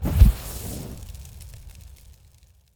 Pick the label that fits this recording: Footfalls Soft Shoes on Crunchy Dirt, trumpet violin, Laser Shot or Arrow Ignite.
Arrow Ignite